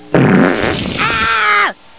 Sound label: oww; svencoop; sc deadthings